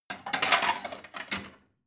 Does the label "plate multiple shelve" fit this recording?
Yes